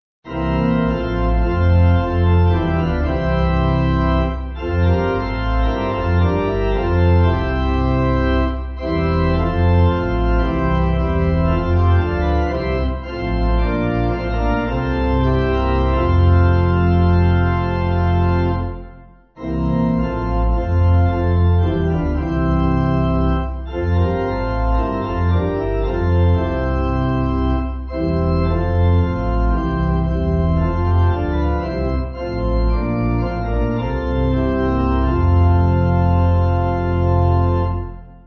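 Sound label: Pipe Organ, Music Backing Files for Churches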